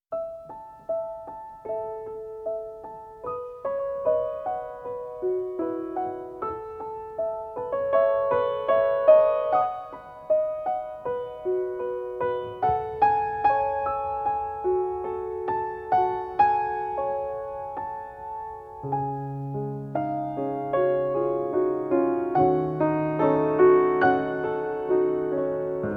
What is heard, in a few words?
Ocean of Diamonds (Piano Reprise)